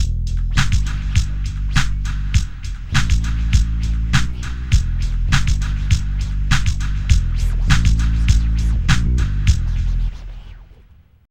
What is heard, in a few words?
Ditty